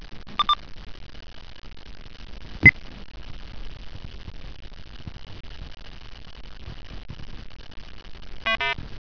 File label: birdie